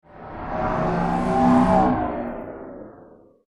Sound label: Science Fiction Spaceship Pass By Whoosh Flange; Spacecrafts